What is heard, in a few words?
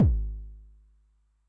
BD MOD Decay Long, bass drum, 909; classic machines; 909mod